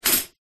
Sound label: household toaster pop up